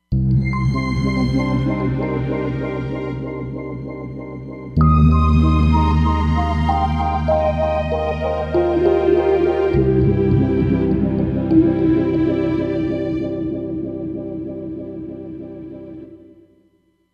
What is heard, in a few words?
Abstract EP